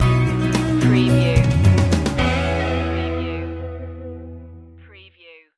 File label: motivational living stinger